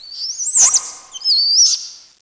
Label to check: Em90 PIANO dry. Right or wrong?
Wrong